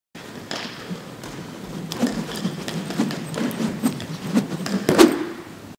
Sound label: Nunchucks; نانچیکو